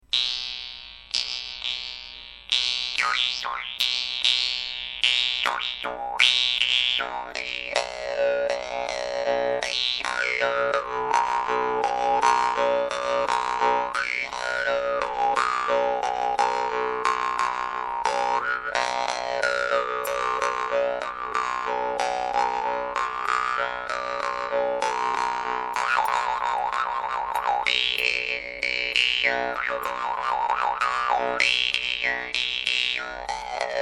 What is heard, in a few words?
vargan kapla; ustinov